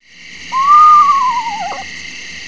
Screech, Screech Owl

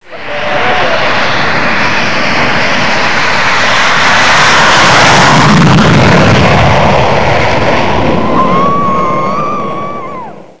takeoff

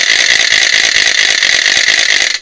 rattle

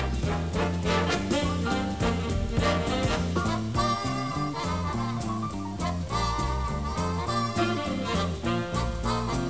Quickstep

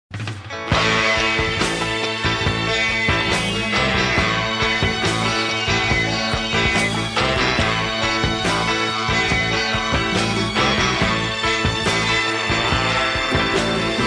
Sitar; Chrome

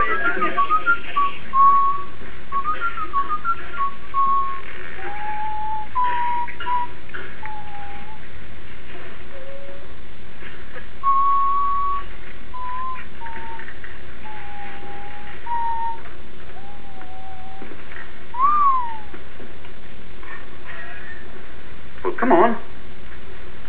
whistle

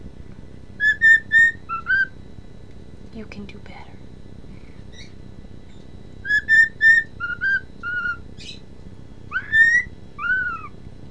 whistle

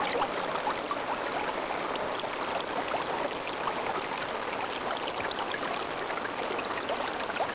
Waterfall

